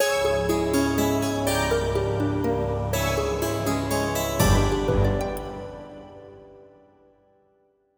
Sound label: Dungeon Loop